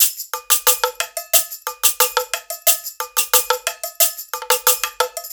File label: Percussionist, 90bpm; Сэмплы ударных (Легкая музыка); Drums; Easy Listening; loop